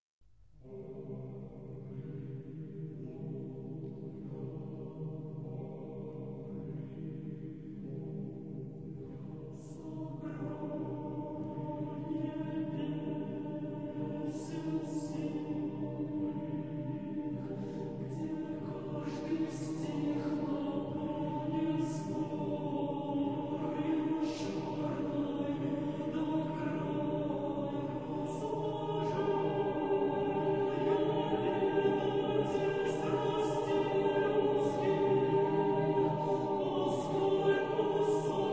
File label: Schnittke Choir